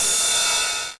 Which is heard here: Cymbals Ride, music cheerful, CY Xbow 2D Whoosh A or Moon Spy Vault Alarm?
Cymbals Ride